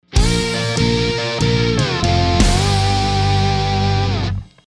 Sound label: Electric Swamp Sting